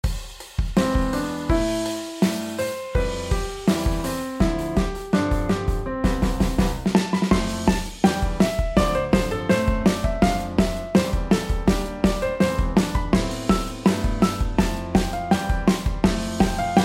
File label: pre drum